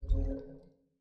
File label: page scroll right; STEAM; tenfoot